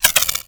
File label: Freeze Ray Mech Chamber; Warframe; Corpus